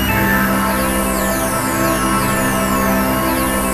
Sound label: ATMOPAD; Best Service; XX Large; Pads